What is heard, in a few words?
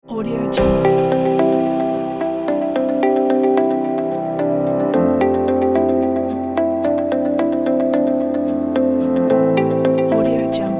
ambient corporate; bluewhalemusic